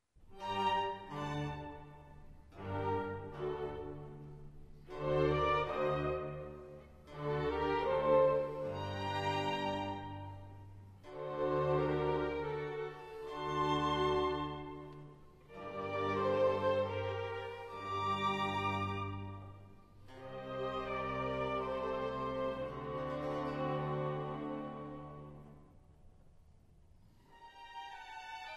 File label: Summer, from violin concerto Four Seasons, 1st movement